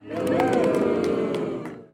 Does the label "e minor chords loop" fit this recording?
No